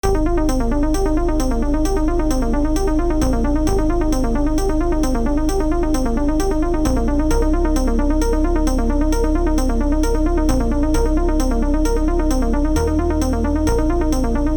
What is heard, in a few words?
random pretty music progressive trance; Original Music